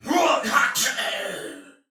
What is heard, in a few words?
Death, Soldier Male; Dialog; Barks; Battle; Sinecure